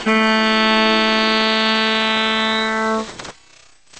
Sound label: guitar reed pretreated